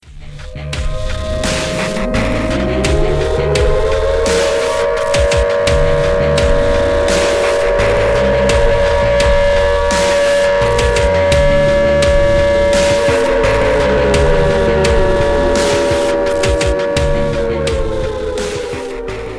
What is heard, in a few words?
alarm; cstrike